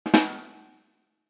Rimshot bah dum

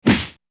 Body Hit

